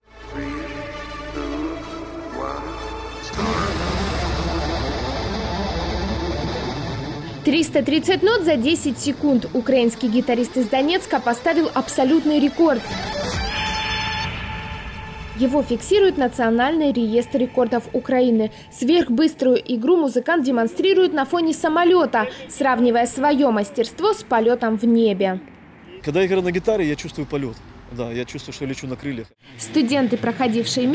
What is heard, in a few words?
GUITAR